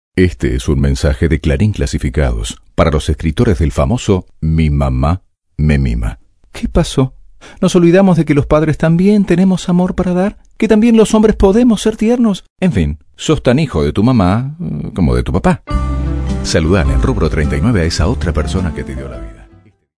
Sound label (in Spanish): clarin padre